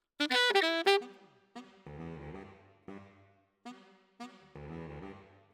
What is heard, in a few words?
Alto, RNR for piano